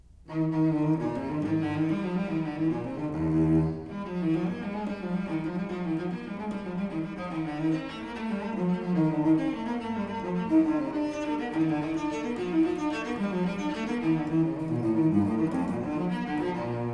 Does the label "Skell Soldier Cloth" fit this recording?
No